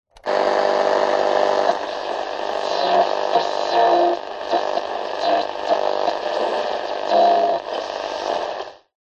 technology radio static buzz hum tuning bursts of stations